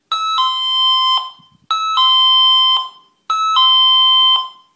alarma puerta

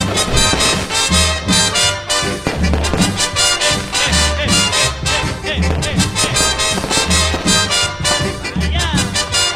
año viejo musica ecuador

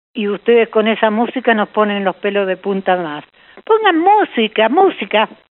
musica

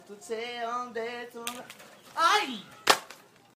cantando en el arbol y se electrocuta

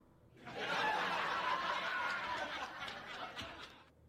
risa

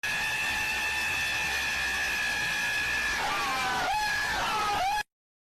risa gato

